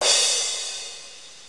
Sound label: crash